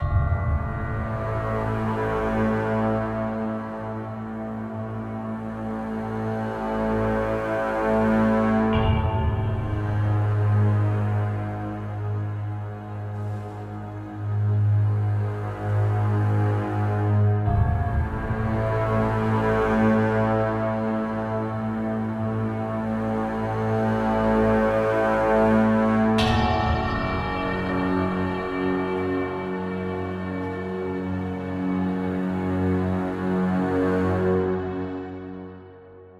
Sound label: Ominous Ambience LOOP; Heavenworld